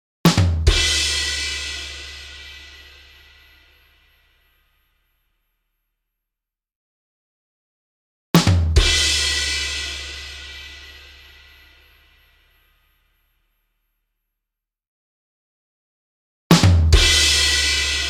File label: Ba Dum Tish